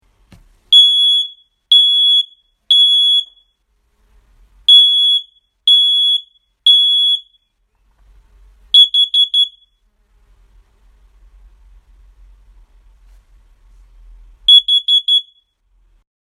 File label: Alarm